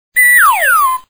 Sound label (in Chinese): 突然蹦出来的声音